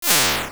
Shoot Homing; Second form shooting a homing magic attack; NightmareShadow2

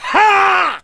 Shout; Ganondorf; Unidentified shouts

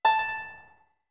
Harp A short; OOT

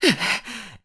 Link Carry Stamina Very Low; Link carrying a heavy object at very low stamina; Skyward Sword

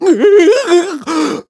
Diving Near Land; Groose nearly landed with Link; Skyward Sword

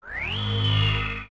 Eye Switch Fire

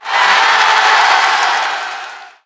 Crowd Cheer

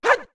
Attack

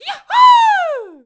Yahoo; Niko (pirate); Zelda

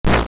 Octorok Spit; Octorok (and Deku Scrub) spitting a rock

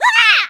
Shock Burn; getting shocked or burned; Link getting shocked or burned; zelda

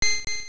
Key Bounce; Keys (and other items, including the Seed Satchel) bouncing; also a big magnetic ball bouncing; Zelda Oracle

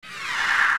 Lurk; Poe Sister; zelda; OOT

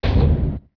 Seesaw Stop

